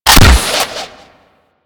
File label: Hunting Bow Prime Fire 3D C; Warframe; Weapons